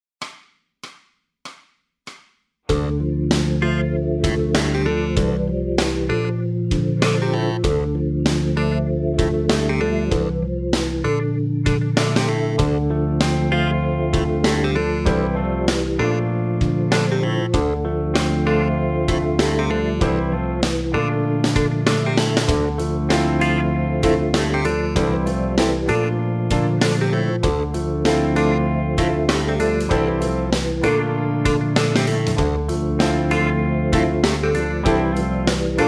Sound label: bass blues funk, backing tracks